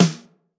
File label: big room acoustic snare sample e key